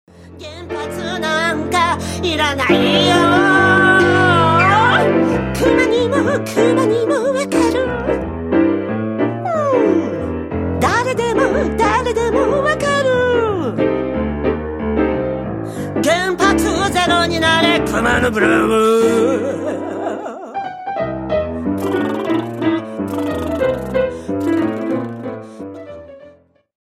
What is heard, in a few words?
bears blues